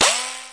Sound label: Grinder; Weird and Interesting Hits